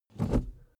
suv pull back gear across

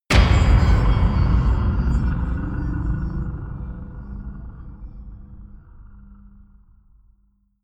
subtle fluttering, metall; hits explosionen

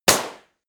snap

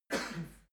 male cough; soundpool

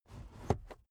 car headrest full adjustment slow

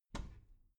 bathroom cabinet door open regular

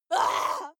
female lunatic shout short; schreie; menschen

